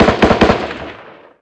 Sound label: gun m16 med